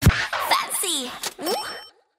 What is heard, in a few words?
OOH